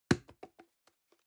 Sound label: plastic can small fall bounce medium; objekte; dose